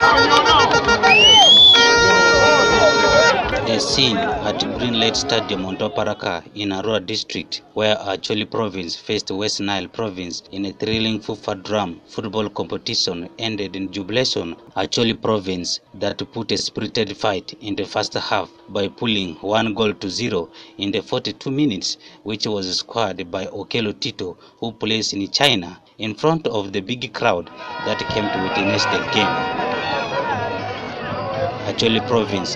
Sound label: DRUM